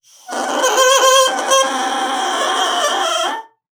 BASSOON; Xsample VOL-6

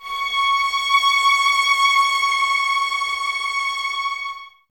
violas C#6, String Ensembles; Miroslav Vitous; VLS 1+2

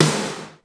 Big Rock Snare

